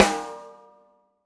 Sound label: snare, gretsch kit